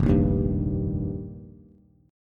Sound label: F 7th Suspended 2nd Suspended 4th, Bass; F7sus2sus4; strummed; JGuitar